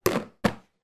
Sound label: household plastic container tupperware lid put on container